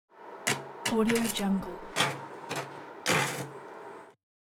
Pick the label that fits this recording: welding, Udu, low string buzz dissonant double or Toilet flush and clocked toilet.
welding